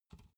big can filled pick up soft

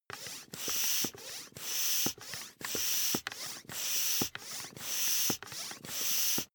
air pump ball sequence fast

